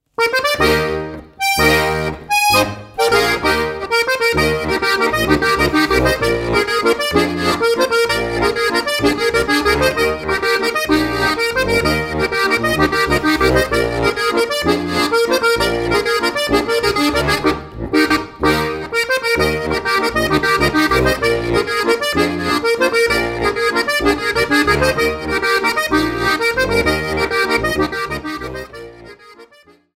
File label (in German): Landler